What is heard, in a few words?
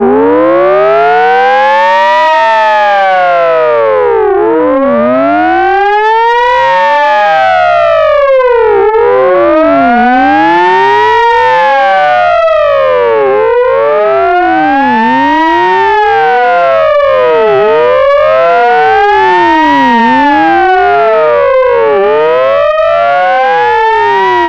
fire truck piercer siren